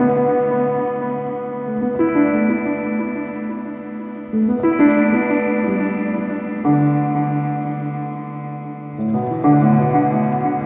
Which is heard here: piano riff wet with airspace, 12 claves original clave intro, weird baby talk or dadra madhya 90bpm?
piano riff wet with airspace